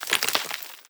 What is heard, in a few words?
debris concrete, bullet impact debris